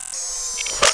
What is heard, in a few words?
zoom out, laser; weapons